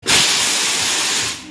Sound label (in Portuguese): fumaca